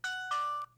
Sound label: motorola krzr k1 ringtones